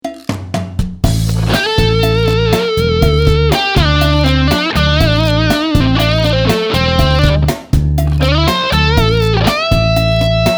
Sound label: patch demo